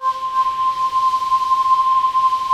hollow pads; Pro-Rec - Synthsonic; Z1 PADS

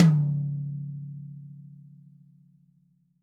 DW Green Maple Tom Room; Sonic Foundry (Sony Creative Software); Vital Drums

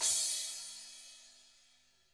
CRTA16, Drums, TAMA; ILIO; Double Platinum Drums 1; TAMA+TAMA

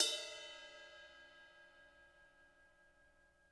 RIDE, RIDE CYMBALS; Spectrasonics - Backbeat

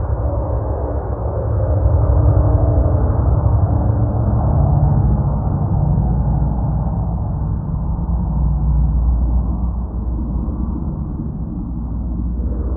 Jets Above Me; Sonic Foundry (Sony Creative Software); Ma Ja Le; Soundworlds

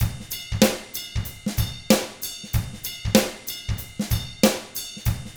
95 FUNK, Drums; ILIO; Double Platinum Drums 1

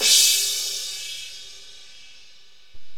CYMBALS; OMI - Universe of Sounds

